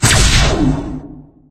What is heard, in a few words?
main shot; bison; tf